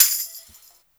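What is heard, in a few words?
percussion, 160 BPM, drum & bass; Ueberschall; Drum & Bass Resonance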